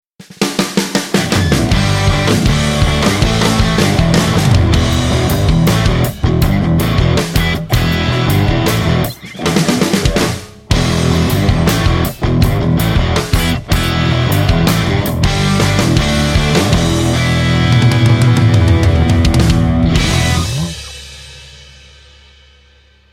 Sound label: triamp mkiii